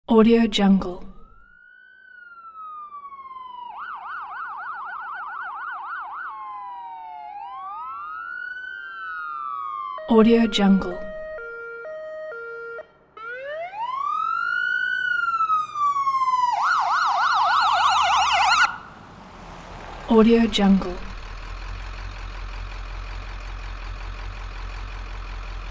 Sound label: ambulance approach and idle with sirens; sound ideas